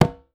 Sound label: tumba, congas; Voice Crystal - Steve Reid Definitive Percussion